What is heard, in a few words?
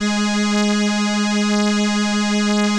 ELKA, String Machines